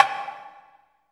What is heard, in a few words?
Dumbek; Roland LCDP14 Africa VOL-2; Af.Hand Drm2; N.Afr.Dumbek